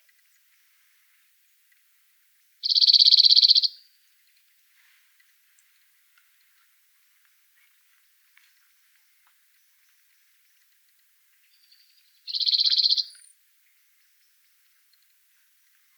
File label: call, cirl bunting, Emberiza cirlus, adult male, This bird shows quite short song phrases; full song; Cirl buntings sing also outside the breeding season